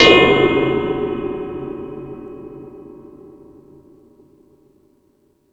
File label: Thundadome, E-MU Pro-Cussion